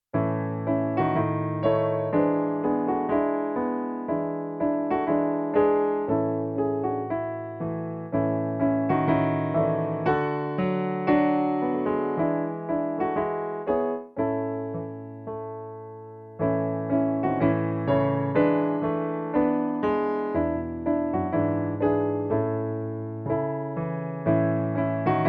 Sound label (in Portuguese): playback hino